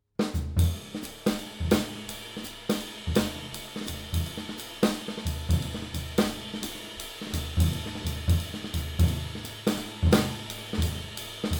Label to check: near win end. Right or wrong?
Wrong